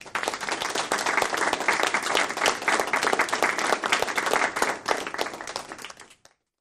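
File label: Applause 20 People Polit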